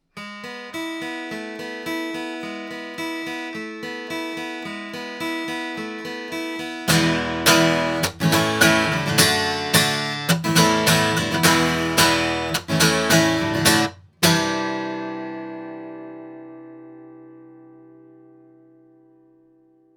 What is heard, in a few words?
Oktava mc219 guitar